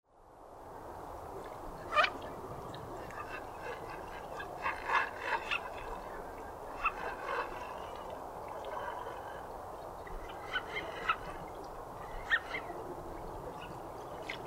wigeon

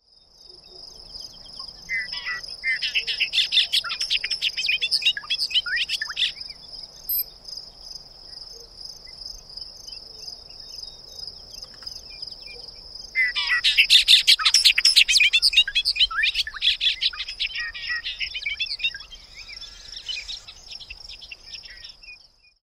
bobolink

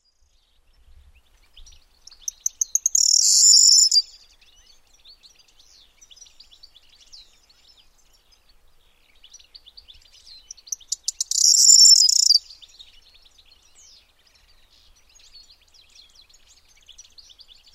corn bunting

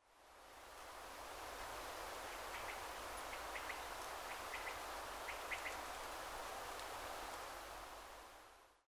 quail

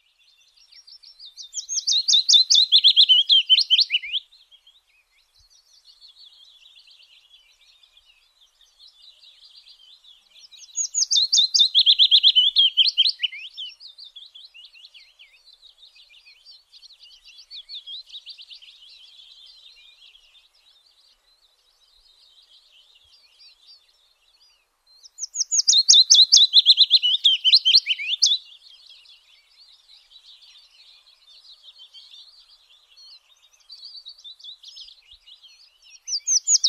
willow warbler

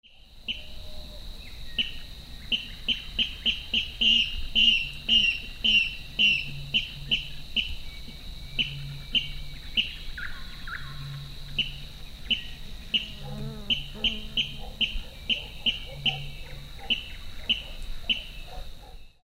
water rail

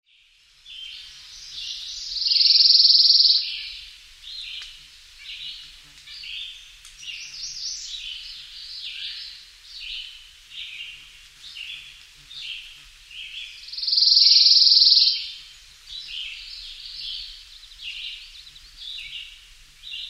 chipping sparrow

